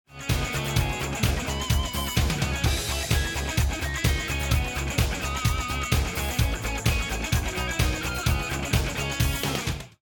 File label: round ten sec count; music kits custom